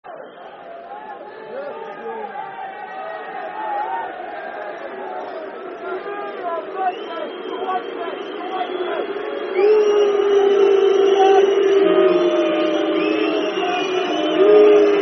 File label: Boo, Football Chant (Soccer Song); Rotherham United Rufc